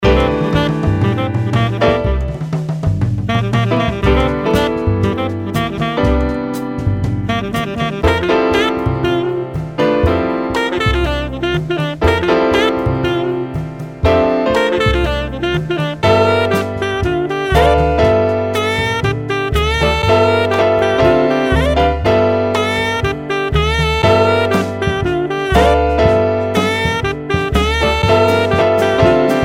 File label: BGM